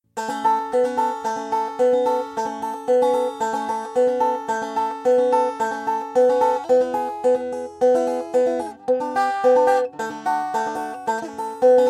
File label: banjo